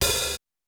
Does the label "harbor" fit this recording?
No